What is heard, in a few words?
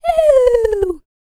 FEM. HUMAN, Vocal, R&B & Dance